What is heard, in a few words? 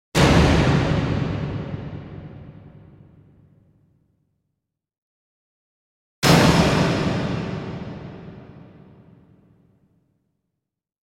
Metal Drum x5; Hollywood Edge Sound Designers Tool Kit